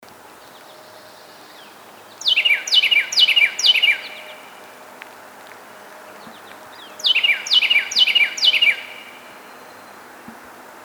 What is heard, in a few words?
Carolina Wren Thryothorus ludovicianus